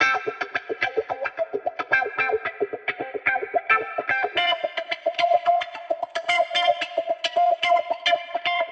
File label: 110 A, Spaced Out Guitars; Loops; Come2Far; Touch Loops; CosmicFunk